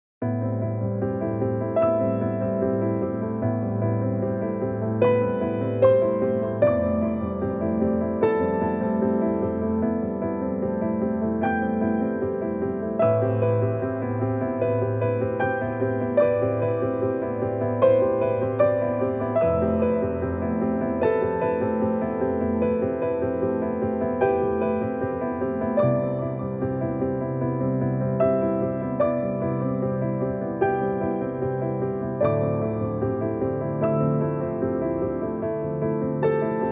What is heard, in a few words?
soft instrumental piano music; burr ridge